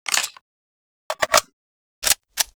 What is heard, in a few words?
akm reload; STALZONE